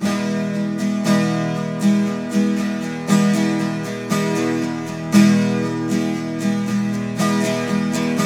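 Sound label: 12 string major F, Guitars; Spectrasonics; Hans Zimmer Guitars VOL-1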